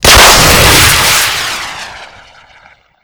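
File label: fire, weapons; lau61c; cobra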